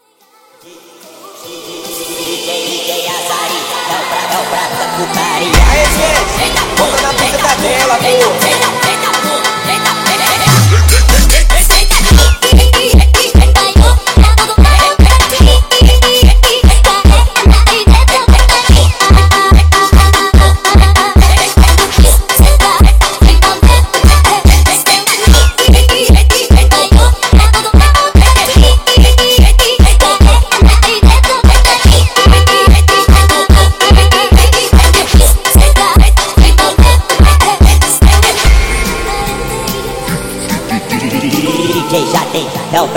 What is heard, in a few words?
FUNK SPED UP